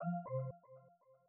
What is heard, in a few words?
hang up